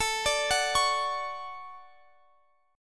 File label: D Major 7th, chord